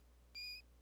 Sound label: Long Squeak, rat